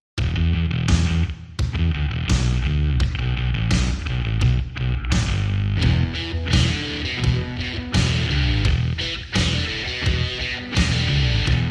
Sports Stomp

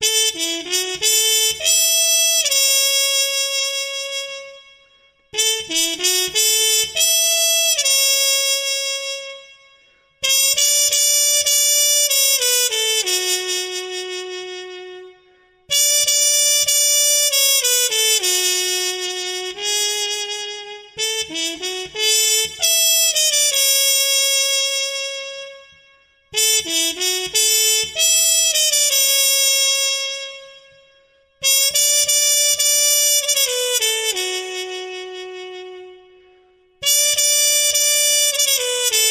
Smile One More Time, Muted Trumpet

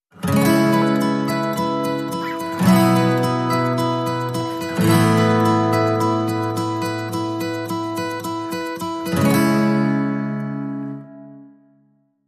Bright Acoustic Days

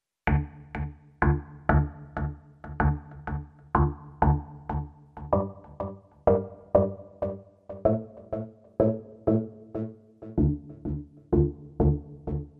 Xylophone

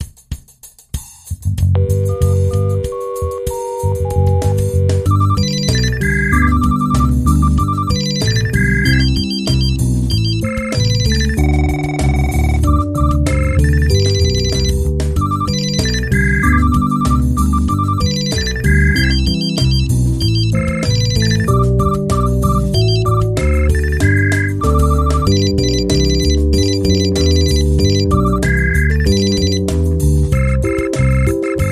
Jingle Bell Phone; Trad (PD)

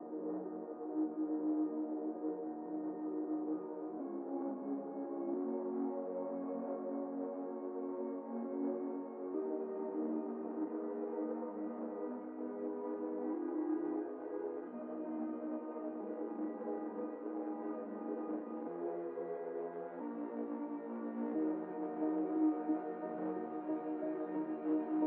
Pads

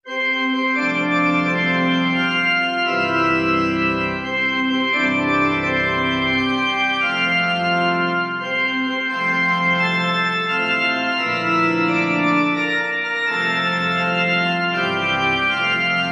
Church Organ Happy Birthday

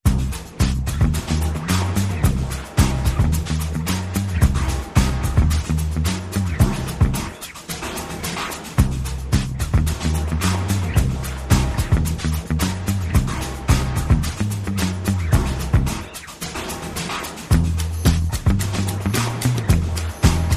Bass and Perc Only

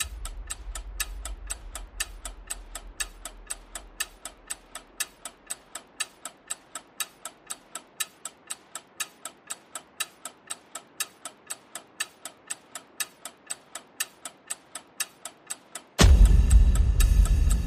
Percussion Ticks and Hits

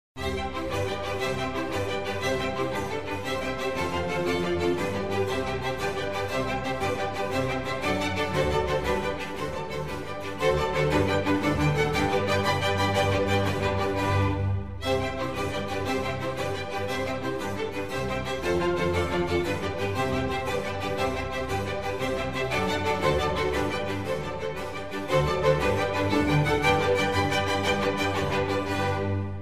Concerto for Strings in C major; RV109; Antonio Vivaldi

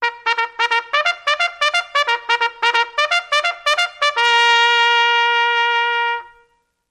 Trumpet Long Charge

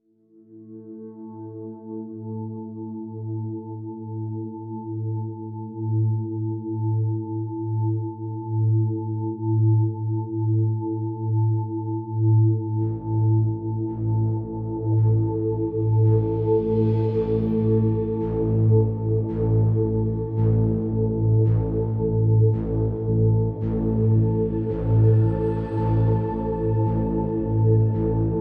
No Melody

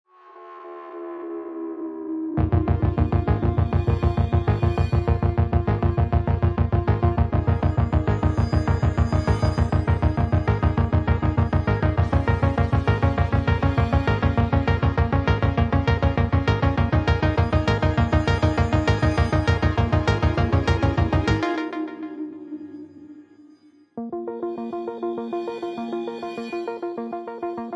Synths Only

